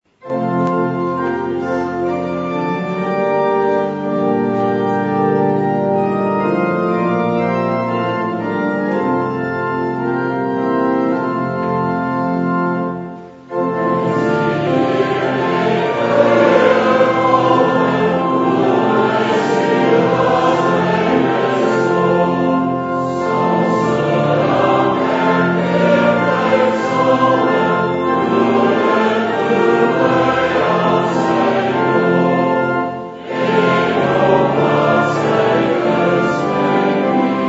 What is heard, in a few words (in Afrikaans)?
Gemeentesang; Ps 146-1 verse 1